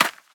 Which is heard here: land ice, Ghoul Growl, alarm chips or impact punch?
land ice